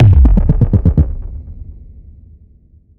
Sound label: QuestFail; Streets of Rogue